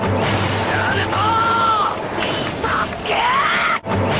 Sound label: screaming; naruto